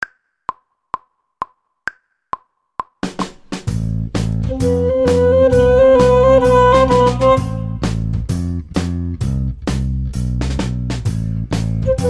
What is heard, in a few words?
flute